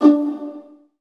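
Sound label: viola pizzicato D2 right, Virtuoso Strings; ILIO; Kirk Hunter Virtuoso Strings VOL-2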